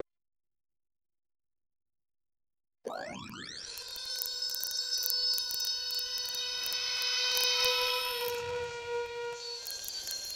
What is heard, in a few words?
the futuristic sound of a, transformations